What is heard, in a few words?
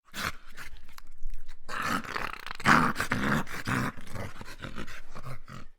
dog growling